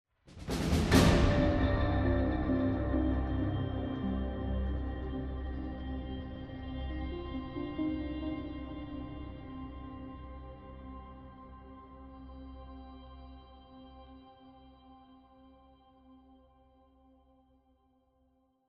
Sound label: Loss; apex legends season 12; Bangalore